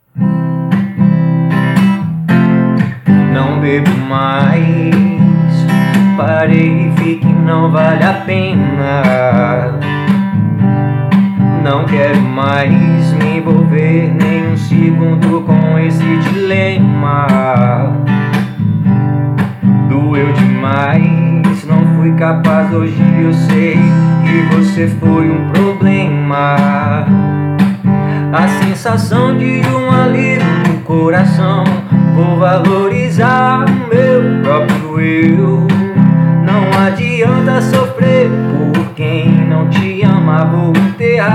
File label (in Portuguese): voz e violão